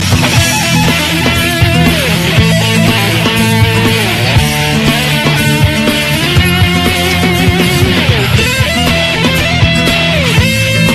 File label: Guitar Tune